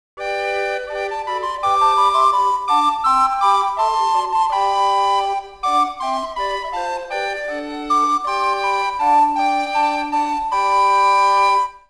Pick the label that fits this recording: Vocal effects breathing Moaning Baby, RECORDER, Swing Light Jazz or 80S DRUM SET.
RECORDER